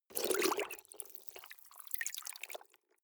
clothes water wring soft, haushalt; haus garten

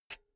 pull out jack cable; objekte; elektronik

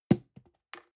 plastic can small fall bounce medium clack; objekte; dose

